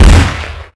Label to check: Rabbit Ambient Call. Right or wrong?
Wrong